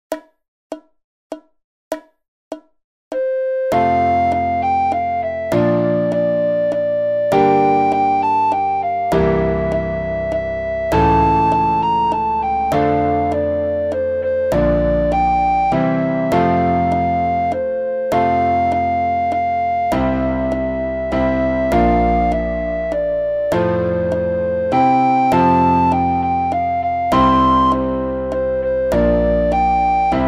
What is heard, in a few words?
we wish you a merry christmas, recorder